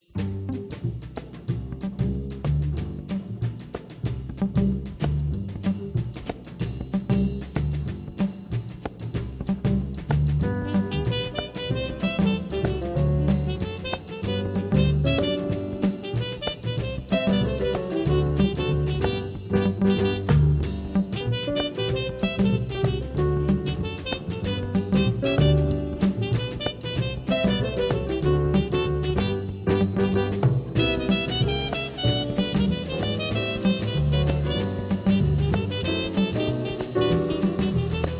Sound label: Roulette Rhumba